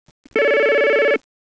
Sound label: ring pattern